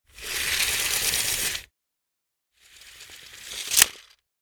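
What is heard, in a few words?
measuring tape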